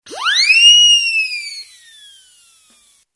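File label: WHISTLE UP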